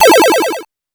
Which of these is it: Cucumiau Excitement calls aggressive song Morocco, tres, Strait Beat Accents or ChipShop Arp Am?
ChipShop Arp Am